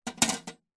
industrial wrench spanner metal small set down on metal tool box; zapsplat